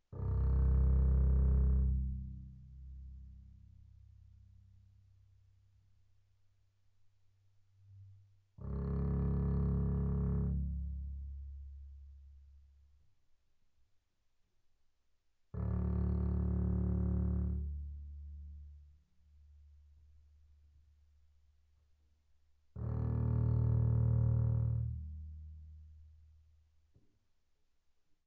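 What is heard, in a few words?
Bass arco sul C mezzo forte C1 Eb1, double bass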